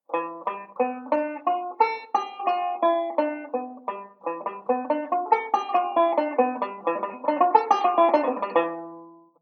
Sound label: Modal Speed Drill, banjo; Beyond Chord Melody Volume II